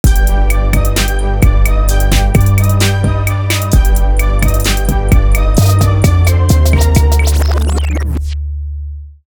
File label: Abspann Instr, jingle; Suzann Profiler Abspann Instr